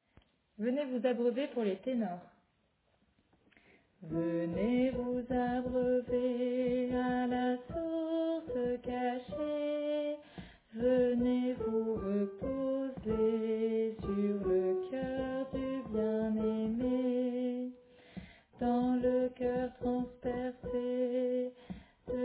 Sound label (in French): Tenor